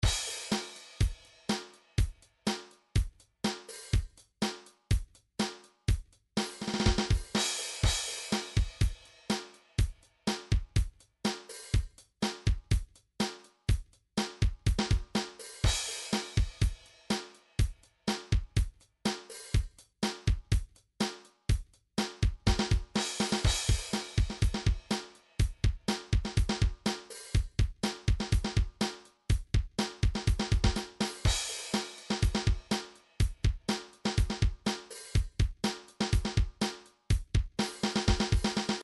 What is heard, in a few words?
drums pop dance, midi drum patterns